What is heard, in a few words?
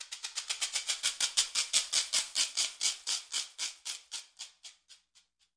shekere roll